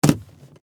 Suitcase Close, Foley, Suitcase, Close, Full, Hard, Shell; Bangs; Objects